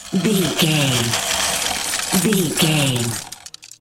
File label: ice spell freeze ground